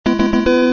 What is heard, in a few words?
OnePlus 12R SMS Ringtone